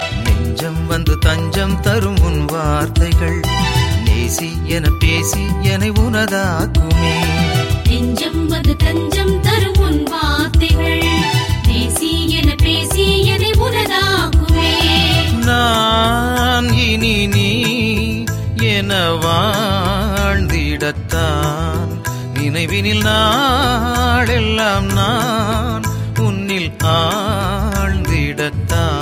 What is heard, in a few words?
Ringtone